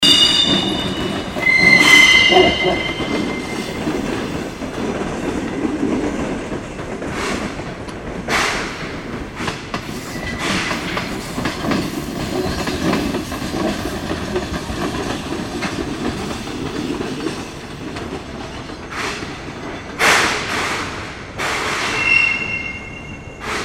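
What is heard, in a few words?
sound of railway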